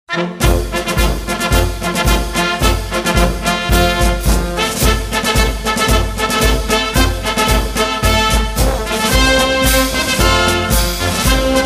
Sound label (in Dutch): intrede mars rustig